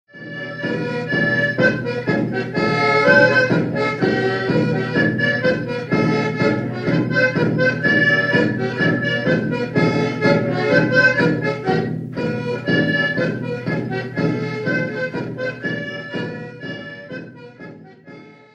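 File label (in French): Polka Piquee; Cheval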